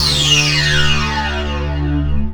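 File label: synth, Production Effects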